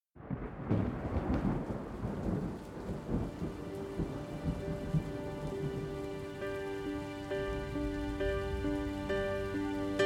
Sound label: rain